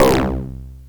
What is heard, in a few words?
blips, opl3